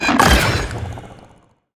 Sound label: spiders heroe instakill